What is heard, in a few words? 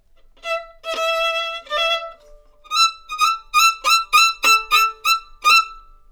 violin Surprise